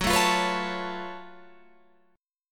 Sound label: Gb Minor Major 11th Gb down Guitar Open F; GbmM11 strummed; JGuitar